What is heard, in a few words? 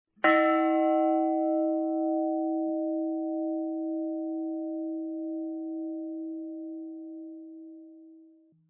russian bells; zvons